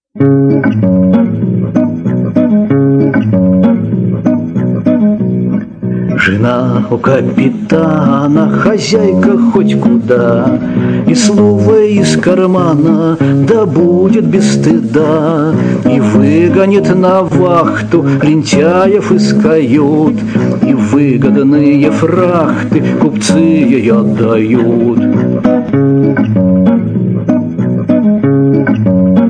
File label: bath